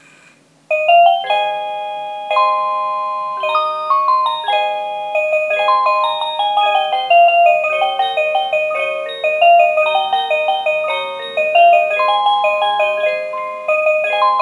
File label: Cuckoo Tune